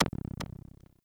UI starchart click node; Warframe; Starchart Redux

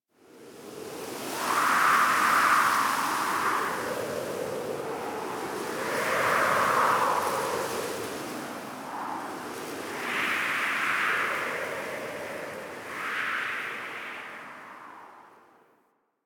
Camp Wind Gust G; Warframe; The New War; Drifter Camp

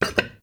Vase Medium Impact; Objects; Vases

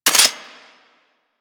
Heavy Shotgun Alt Mech; Weapons; Warframe; Tenno

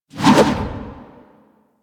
Melee Charge Swing Whoosh; Warframe

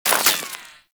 Bullet Impact Debris Rock; Warframe; Weapons

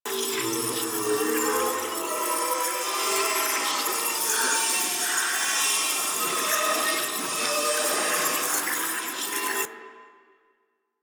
infested mass loop; EndlessCapture

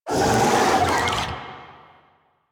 Infested Big Ashen Vocal Attack; Warframe

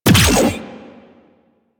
Detron Power Layer A; Warframe; Weapons; Corpus; Lich

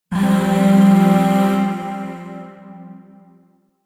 Shawzin Pent Min Chord F; Music; Chords; Warframe; Zariman Void Shawzin; DE

